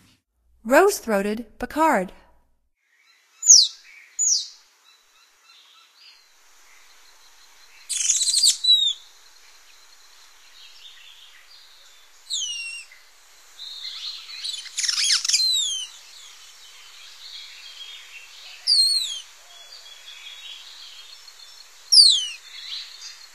Rose-throated Becard, Songs of Birds of North America; Stokes